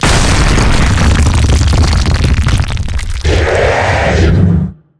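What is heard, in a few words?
real undead golem boss spawn, monster; thedead3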